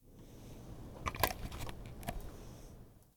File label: Plugging In Laptop